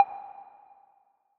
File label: back button click; leviathan